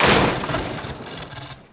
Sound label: item electronics break